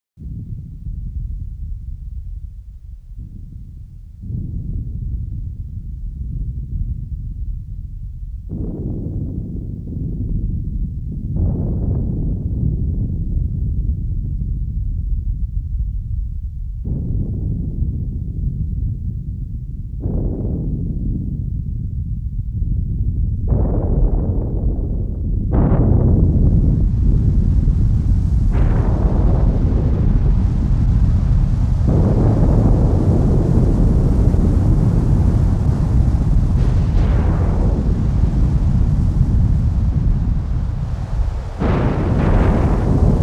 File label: Thunderstorm; all minimoog